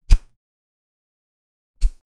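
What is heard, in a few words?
sound of single sword swing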